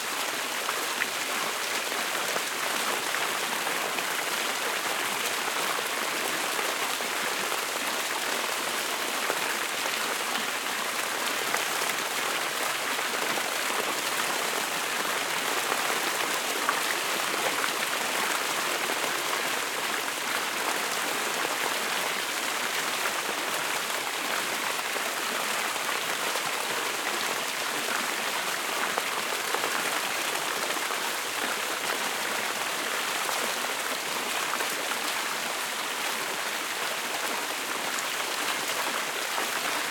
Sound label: FOUNTAIN Medium, Invigorating Network of Dribbles, Close; Articulated Sounds; 100 Fountains